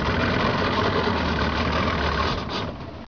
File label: nautical; GRSITES Graphics